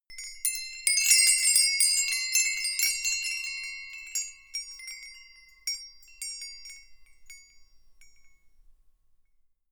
windbells ringing

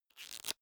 small book riffle through fast

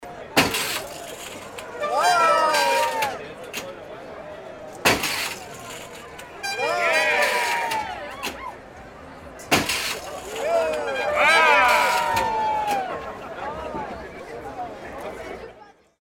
try your strength machine

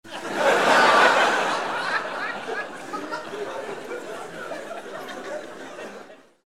laugh long

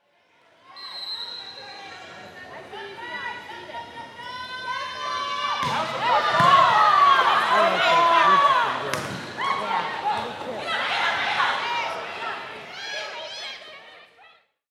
volleyball match

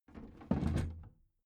big plastic case lid put down soft

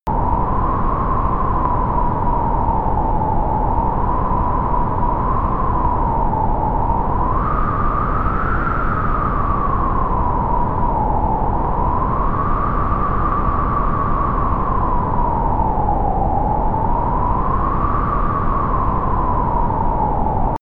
dark storm loop

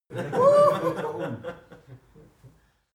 laugh male loud

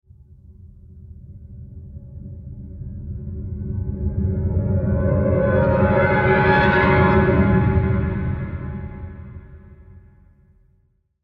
low fearish flanger

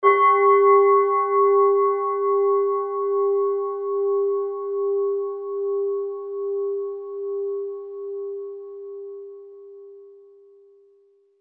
japan black racko bowls g

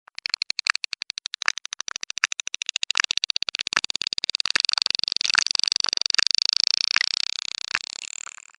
whale klick noise

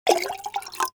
bottle fill glass

